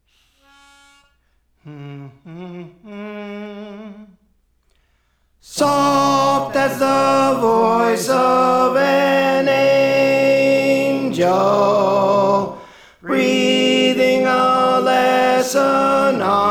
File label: Tenor; Praise For The Lord Training